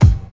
phat bass drum sound a key, kick drum sound tuned to the A note; kick drum samples